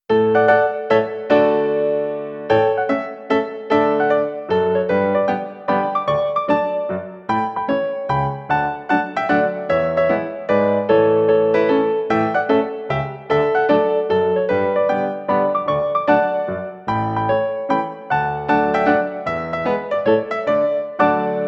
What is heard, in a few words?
Mazurka; Delibes